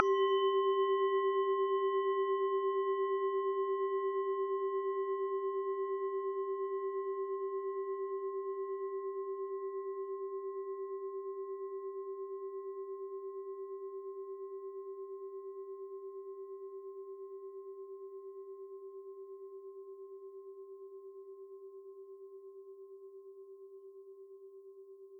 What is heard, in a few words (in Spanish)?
cuenco